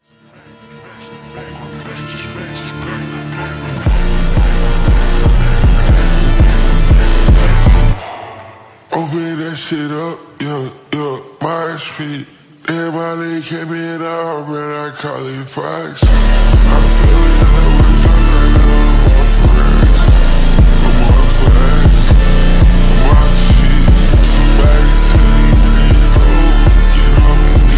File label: guitar remix slowed reverb